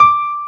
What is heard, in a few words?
PIANO, Pianos Mixe